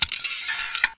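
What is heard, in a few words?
crossbow reload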